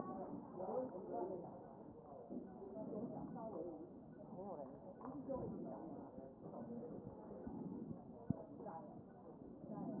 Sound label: CHEST pos3 D MODE